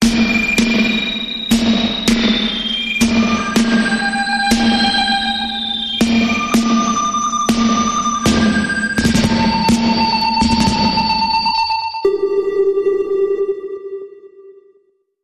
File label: Sonar Sci Fi Bumper Reversed